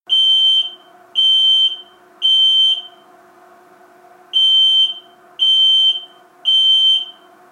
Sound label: CO Alarm